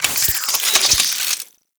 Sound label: Equip; Weapons; Warframe; Tenno; Wraith Sidearm; Epitaph Prime